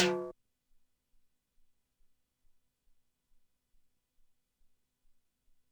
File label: Timbal, ELKA X1000